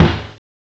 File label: RIM; DRUMS; CMI Sound Library Rev 1.3